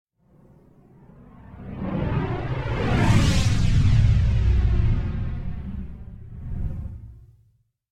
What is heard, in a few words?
Whoosh Explosion Fly And Destroy - Phased; Hollywood Edge; sound designer's toolkit v2